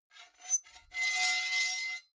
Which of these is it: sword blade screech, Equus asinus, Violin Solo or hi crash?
sword blade screech